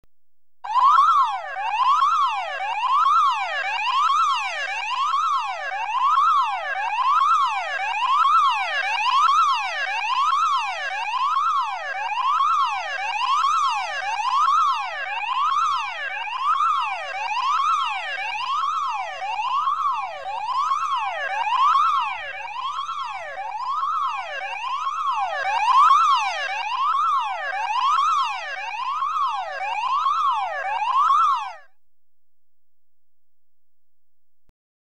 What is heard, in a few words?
Emergency Alarm (With Slight Ph, Electronically Generated Sounds; BBC Sound Effects Library